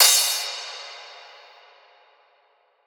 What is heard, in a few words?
cymbal crasher; Drum Sample Pack; One Shots; Cymbals; Slate; SEISMIC